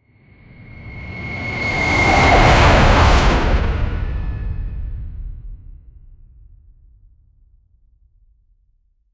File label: powerfull tridents rele